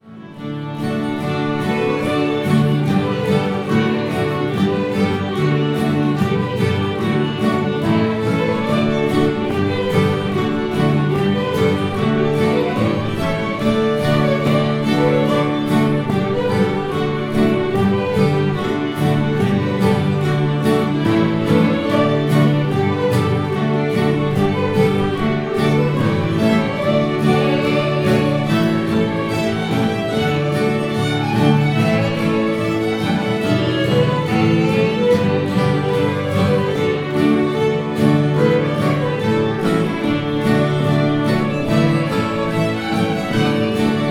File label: Hornpipe; Fishers